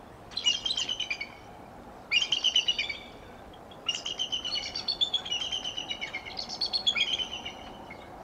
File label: 2nd Call Section harmonising and call response